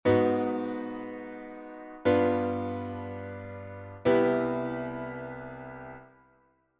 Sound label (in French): sous dominante chromatique, improvisation jazz; substitutions reharmonisations